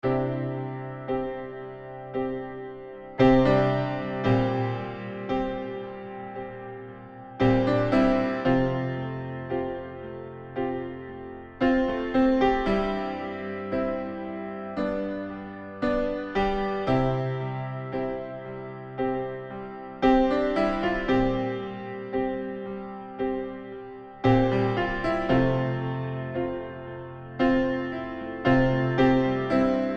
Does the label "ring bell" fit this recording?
No